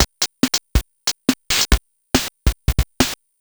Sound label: GB Drums, 140 BPM; loops; CHIPSHOP DELUXE